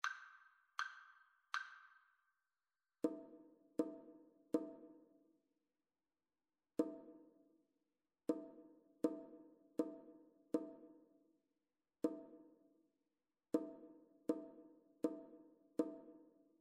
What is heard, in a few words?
rhythmic dictation